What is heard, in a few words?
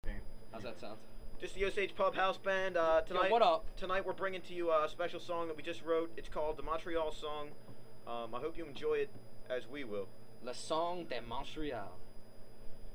Pub House Band